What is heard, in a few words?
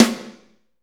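SNR, snare, Drumscapes Roland; Northstar; Fast Shuff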